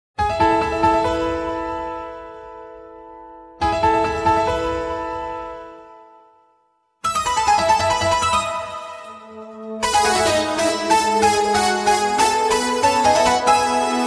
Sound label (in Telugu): Sad, Male; Telugu; Om Shanthi (2009)